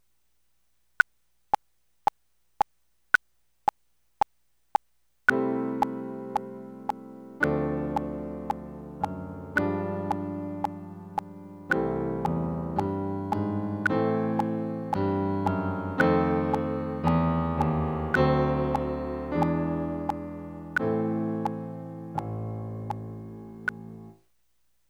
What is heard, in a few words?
Hound Dog Blues secondo